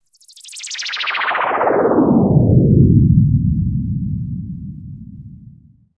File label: INSECT, Special FX Twisted; USB Soundscan; AKAI